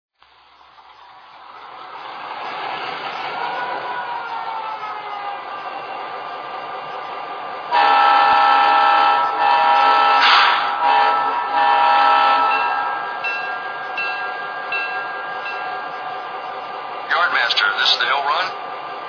GP20 3 chime

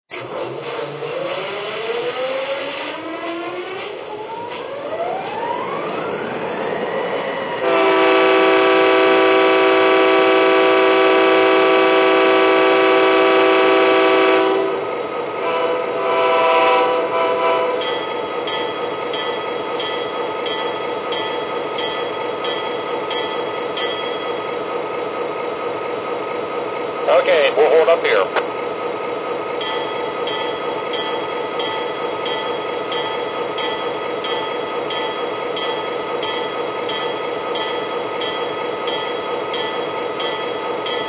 sd40 2, trains

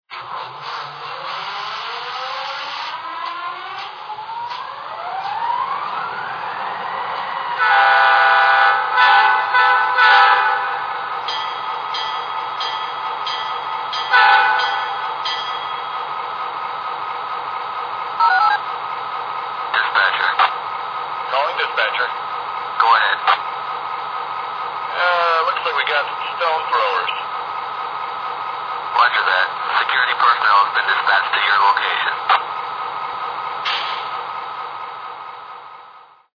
SD35, trains

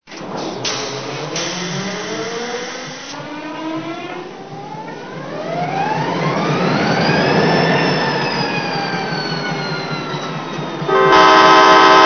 F40PH; AMT

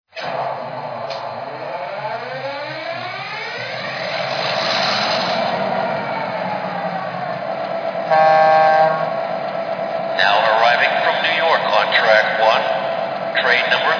F3, trains

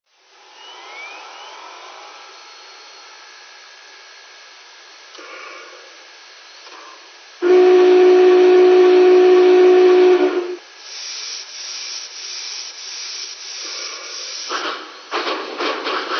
BIG BOY 4014

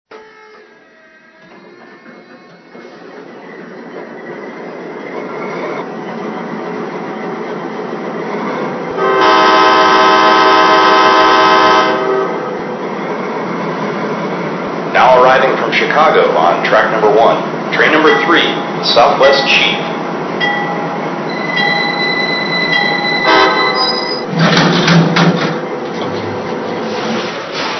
GE Dash 8 40BW

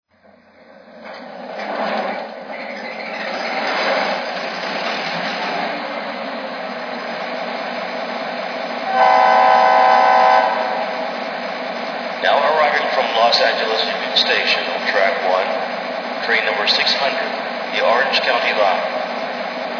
f40ph, trains

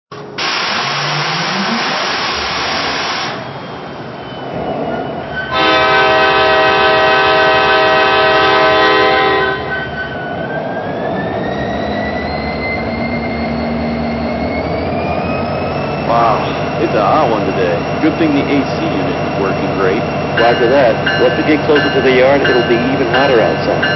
SD70ACe

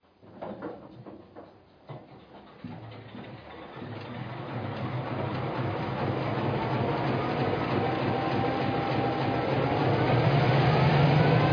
EMD NW-2

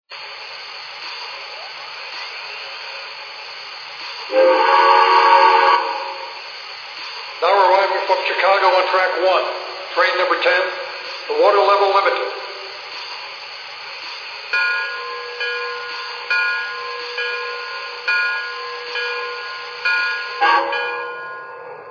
hudson; nyc

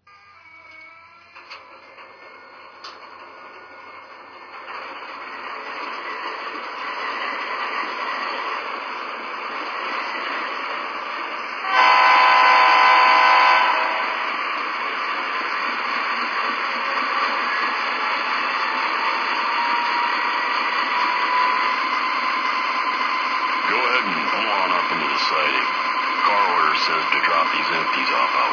es44, trains

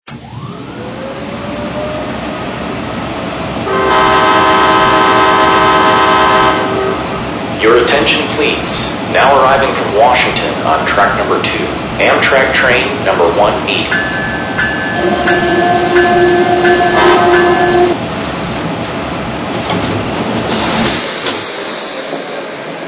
AEM-7, trains

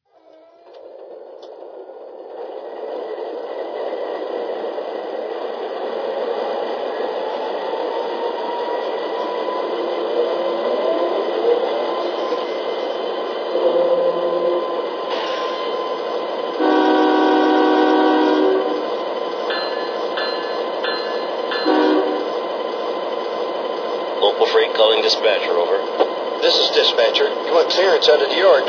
es44

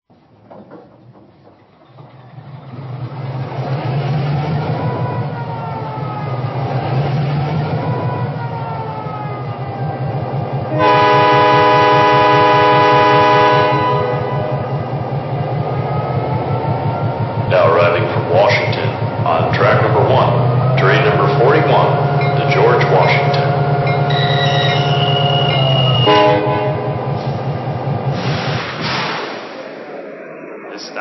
EMD E-8, trains; CO

